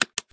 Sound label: Button Console; MiSide